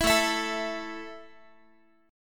A 5th A down Guitar 4A,4E,5A,5D,5G sharp,6C sharp, A5 Chord; strummed